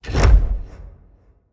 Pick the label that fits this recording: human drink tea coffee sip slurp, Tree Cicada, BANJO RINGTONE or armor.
armor